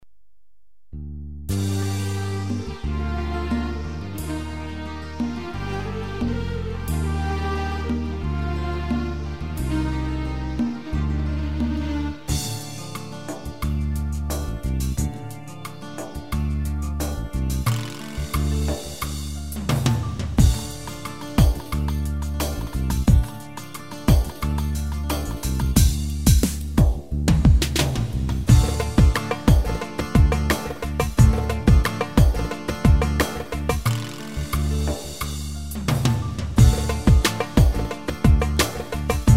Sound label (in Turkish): vahde, ketron yeni ritim